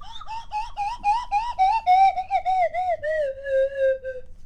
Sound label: Drunk, Slide Whistle